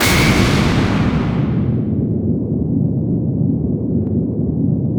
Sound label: IGNITE, WARHEADS; Spectrasonic Distorted Reality 1; WARHEADS 2